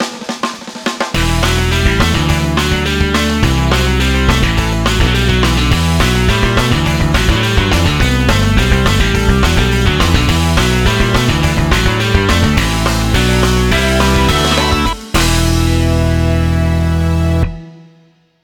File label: invincibility music; Sonic 2006 Proto